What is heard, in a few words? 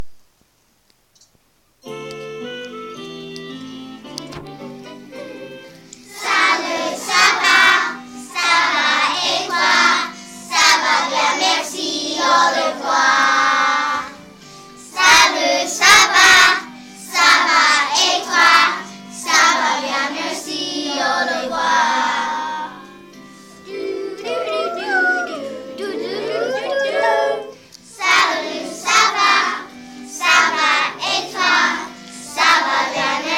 french song